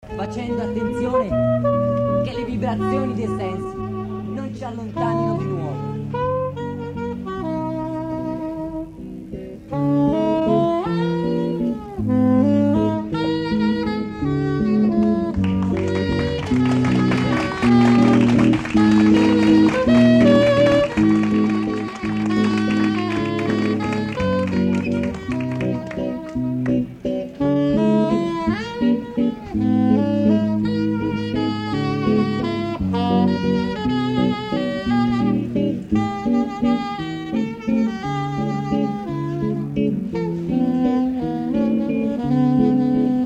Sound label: waltz